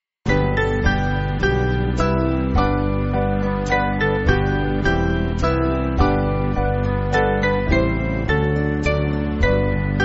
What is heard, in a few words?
Band, church music; O God Of All Grace; Harwich